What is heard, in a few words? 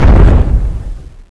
footstep, npc; oberon